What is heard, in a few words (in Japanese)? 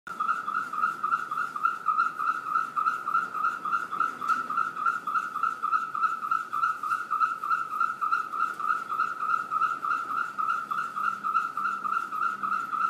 金属の虫笛（うなり木）